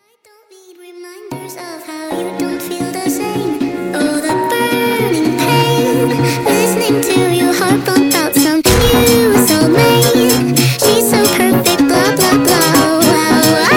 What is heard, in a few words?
Phonk Music; فانک phonk